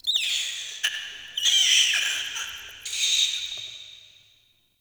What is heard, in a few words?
Interior Screechy Call, Infested, Ambience; Misc Indoor